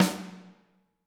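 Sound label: snare drum, Drums; Bob Clearmountain Drums I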